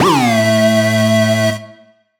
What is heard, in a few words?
Siren Horn down, Siren-Horn Type Hits, Ramp Down; future rave